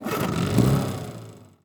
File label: vehicle woodboat ignition; teardown; ps5 windows xbox series xs